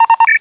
communicator; 1997 MMX LAN PACK (win 95); Army Men

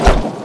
Sand Bucket Destroy; 1997 MMX LAN PACK (win 95); Army Men

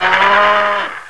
alien cow die; 1997 MMX LAN PACK (win 95); Army Men

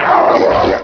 DEATH; QUAKE 1; SHALRATH

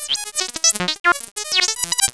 computer; 1997 MMX LAN PACK (win 95); Army Men

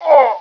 PAIN; Quake 1; ENFORCER

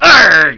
arrrgh; Army Men; GreenUnits

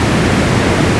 TV Snow; 1997 MMX LAN PACK (win 95); Army Men

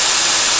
aerosol; 1997 MMX LAN PACK (win 95); Army Men

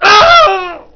ahgieie; Army Men; GreenUnits

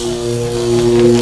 Electric Arc; 1997 MMX LAN PACK (win 95); Army Men

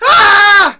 Civilians ahhh; 1997 MMX LAN PACK (win 95); Army Men

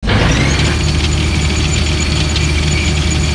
tank; 1997 MMX LAN PACK (win 95)

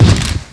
soda can explode; 1997 MMX LAN PACK (win 95); Army Men

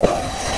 HQ door; 1997 MMX LAN PACK (win 95); Army Men

